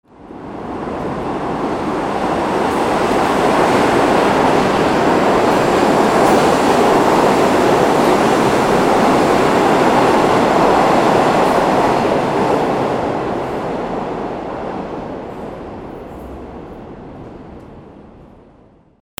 TRAINS

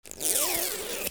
OFFICE

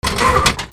flight

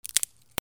BREAKING

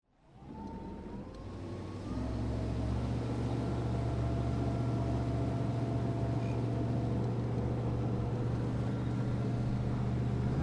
BOATS

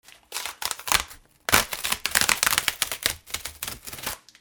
RIPPING

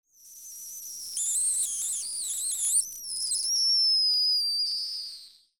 KITCHEN

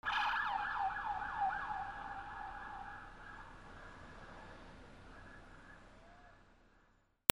VEHICLES

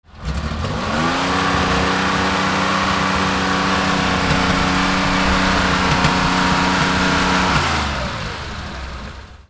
CARS

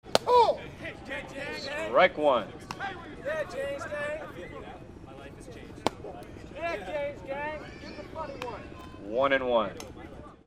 GAMES

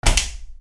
BATHROOM

